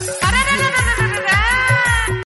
dj arrr ringtone

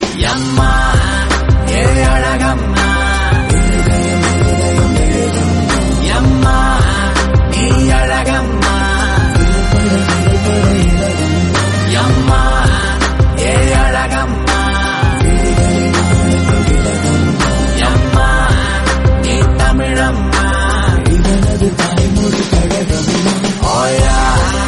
heart touching tone

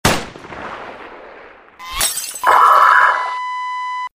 sniping; a combine

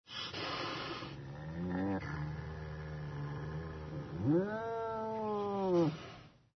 animal cat

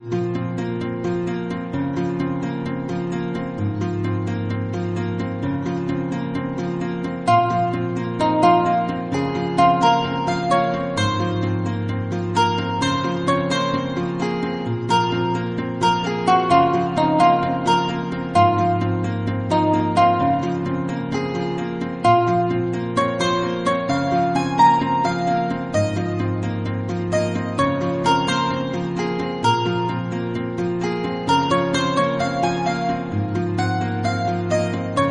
romantic

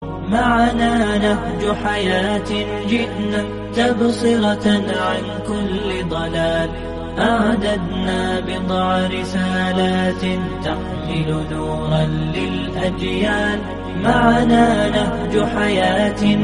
indian pop

